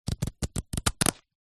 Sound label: leisure playing cards deck flick through